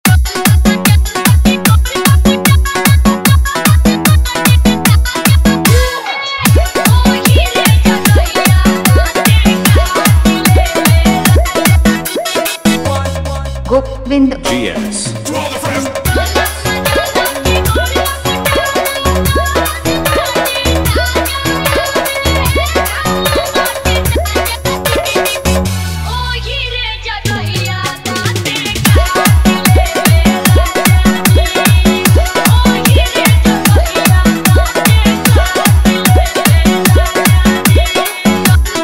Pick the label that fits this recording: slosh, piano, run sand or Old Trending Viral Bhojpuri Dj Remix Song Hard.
Old Trending Viral Bhojpuri Dj Remix Song Hard